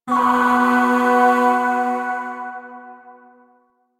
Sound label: Zariman Void Shawzin Chromatic Chord